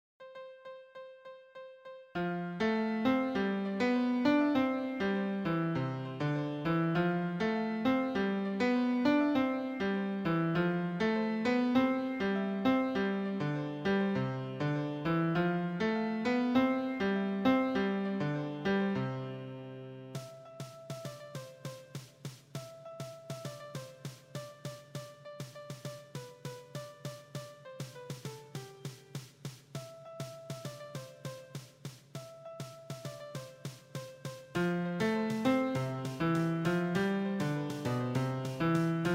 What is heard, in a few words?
Ac. PIANO